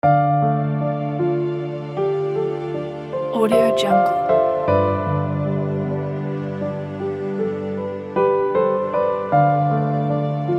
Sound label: sad cinematic piano and violin